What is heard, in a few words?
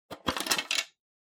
Pencil Case Open